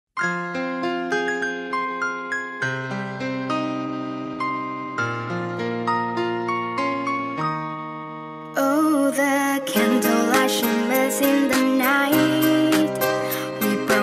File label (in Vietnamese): nhac chuong English Cover; Elight